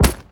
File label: defuser bullet impact; physics; weapons